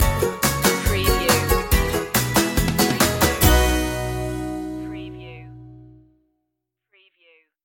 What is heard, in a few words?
keep it simple stinger